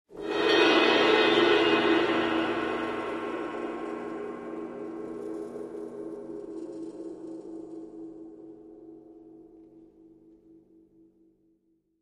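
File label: Cymbal Large Trill Timpani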